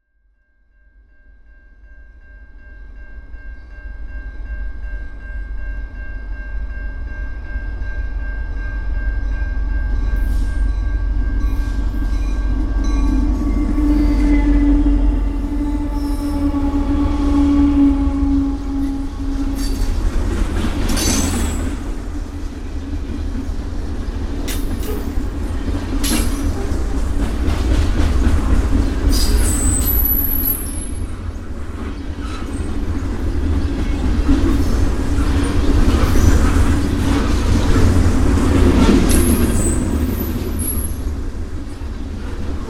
railway crossing and freight train, Burnaby BC, Canada